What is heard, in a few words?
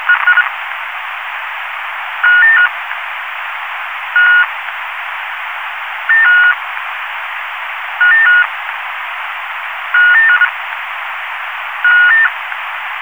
beeping sound, canon xf105; canon xf series